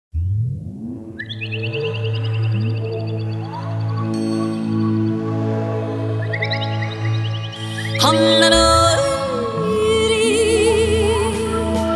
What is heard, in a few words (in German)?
Jodler, jodeln